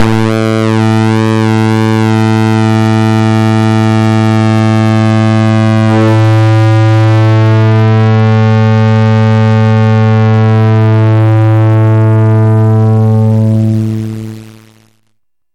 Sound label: erratic clutch deluxe purefuzz normal